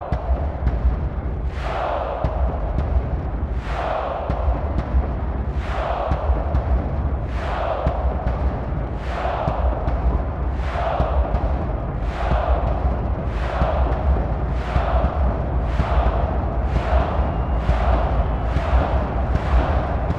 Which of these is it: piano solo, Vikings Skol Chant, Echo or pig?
Vikings Skol Chant